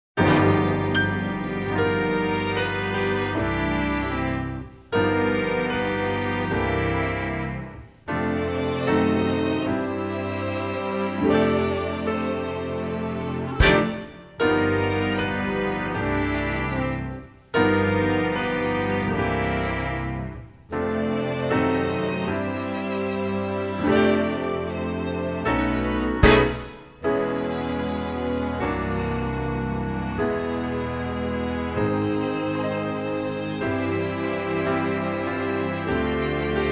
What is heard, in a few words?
accompaniment; sky masterson